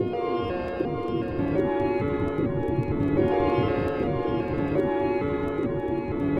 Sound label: Temple Dojo D Ambient